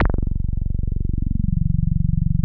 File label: TB 303 BASS; BASS SOUNDS